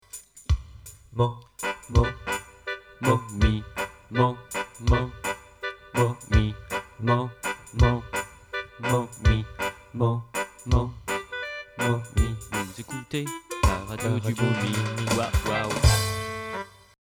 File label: jingle